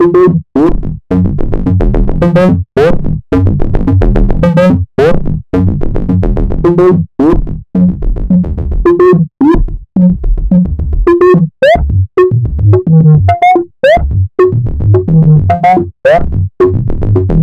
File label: Synth Distorted; Electrocution